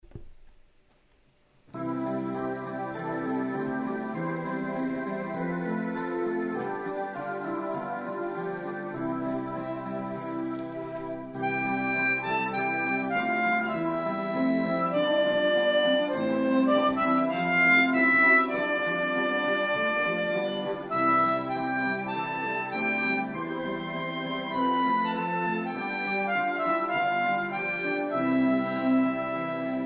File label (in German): Alle Jahre wieder C, Mundharmonika